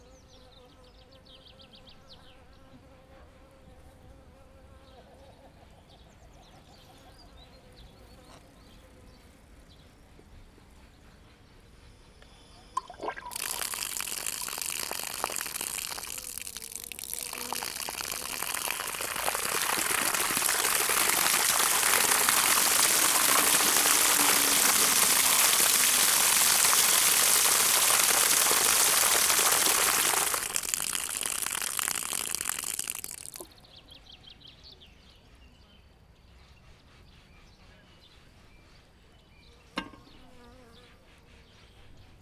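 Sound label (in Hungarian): rövid károgás, előbb csörgő, utóbb berosen; Szögliget; artézi kút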